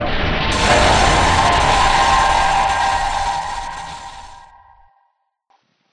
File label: Jumpscare; Fredbeer; Five Nights at Ronald's